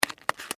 Cigarette Box Close, Foley, Cigarette, Box, Close, Lid; Smoking Sound Effects; Human; Blast Wave